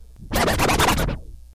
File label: record Scratch short